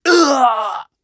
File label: miner death